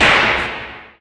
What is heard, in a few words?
nether dug; nether-pack